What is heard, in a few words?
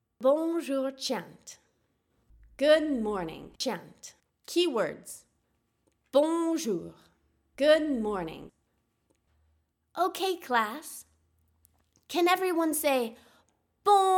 Good Morning Chant French; Rituals